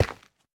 玄武岩踩踏声

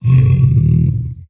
僵尸疣猪兽待机声

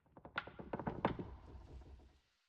苍白垂悬苔藓声

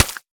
泥巴破坏声

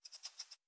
兔子待机声

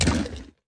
嗅探兽进食声

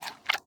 熊猫进食声

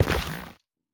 下界岩踩踏声

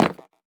下界砖踩踏声

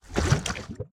炽足兽熔岩上行走声

